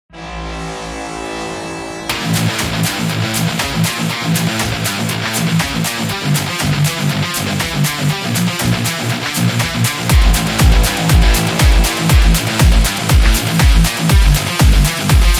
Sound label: Dubstep Metal